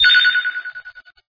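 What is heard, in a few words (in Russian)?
однократный писк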